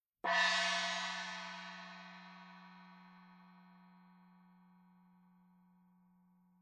Ma Xi Medium, gong; gongs

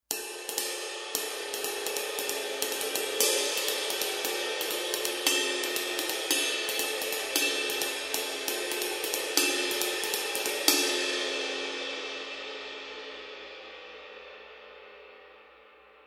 medium ride cymbal; Vault Artisan; Sabian

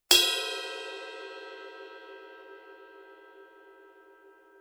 bel, cymbal; HH Vintage Ride; Sabian

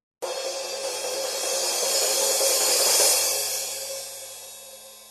Sabian B8 Crash Cymbal 16"

